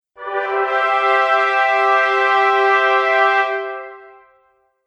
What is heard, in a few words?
Short Epic Fanfare; Musical